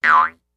Jews Harp Shrt Boin, boings

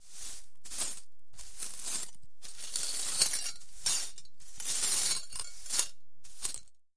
Sweep Floor Glass Pi; glass clinks

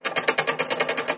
Large Chain On Gear

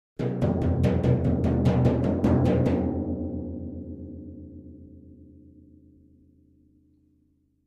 Timpani, (Hands), Accent, Symphonic Finale Hits

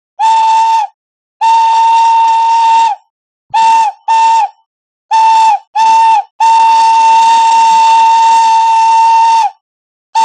Steam Train Whistle

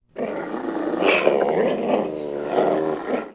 Dinosaur Low Growl

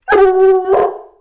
Coon Hound Dog Howl

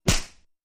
Big Face Slaps Huge; flesh

